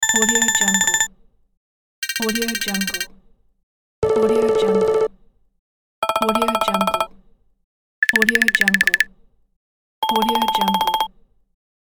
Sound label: rapid game point counter pack